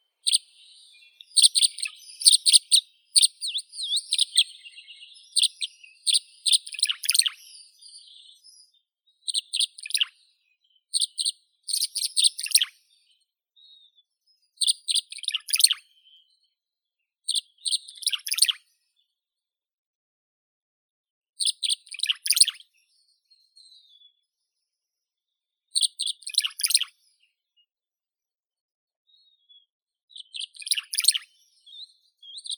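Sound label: tree swallow, Tachycineta bicolor; lastochka rechnaya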